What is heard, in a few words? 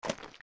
steps; Jabu Jabu; zelda; OOT